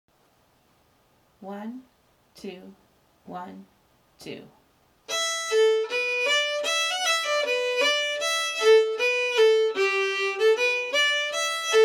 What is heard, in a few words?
polka